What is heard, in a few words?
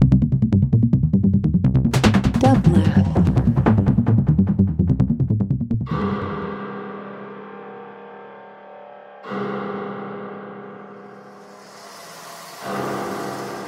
Techno, industrial, noise; from the curator/producer of the LA based party Queerspace.